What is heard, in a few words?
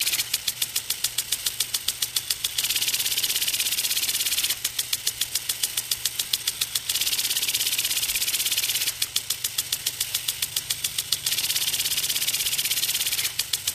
Sprinkler Plastic, sprinklers